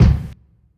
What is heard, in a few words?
verby kickdrum d key, bass drum sample tuned to the D note. Loudest frequency: 207Hz